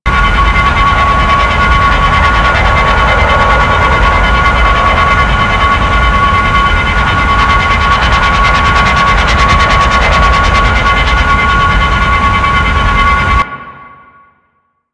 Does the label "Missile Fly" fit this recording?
Yes